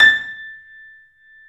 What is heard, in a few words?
3D Boesendorfer, Pianos, A; E-MU Producer Series Vol. 5; 3-D Audio Collection